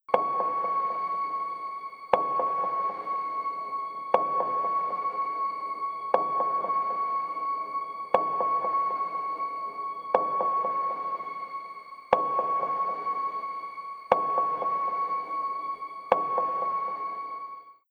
Earth Ping

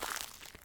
Player - Step left

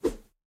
Swing Large

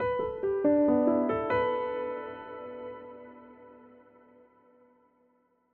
Music Exploration Transition; Planet of Lana; Music Ch04 Exploration Transition 70

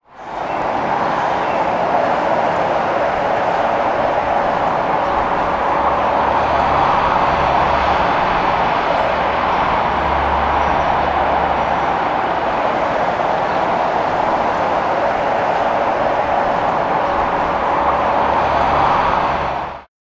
Cities cape Wind; google earth vr linux vr windows gamerip 2016

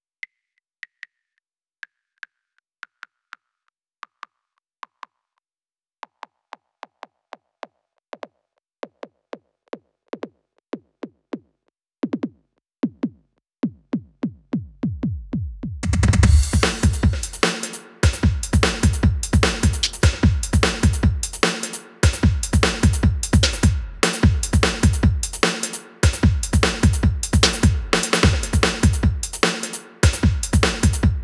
Drums 150; Surface Tension

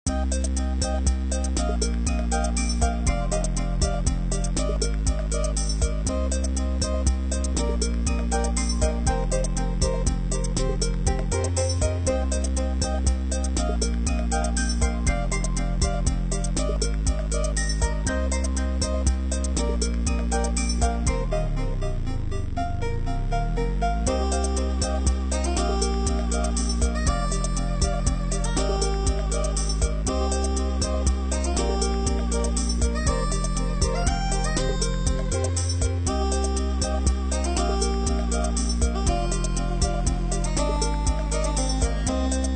pensive; super stickman golf 3

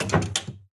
Door Close

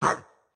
Chop Attack; Caffiend Caelan; Going Under

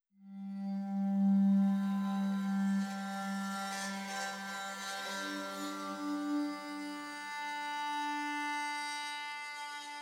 Cherrapunji Pads 90

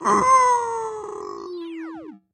Death; Caffiend Caelan

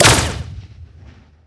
Troll Shooter Shoot Fire

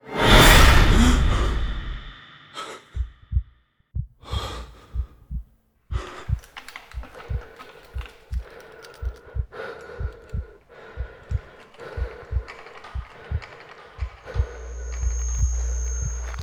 Stinger; Syndrome; Deadcrew

